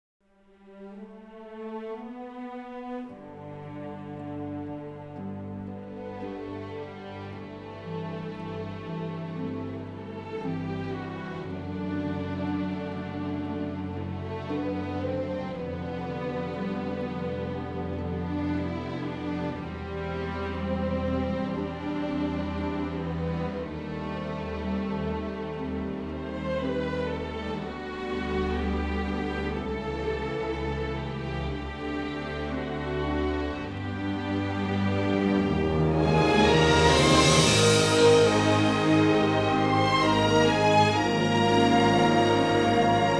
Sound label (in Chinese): 红色经典音乐; 红色经典背景音乐